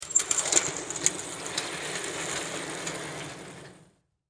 Stage Curtain Close, Foley, Stage, Curtain, Close, Small, Theatre; Film Sets; BlastWave